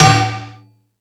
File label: Multi FX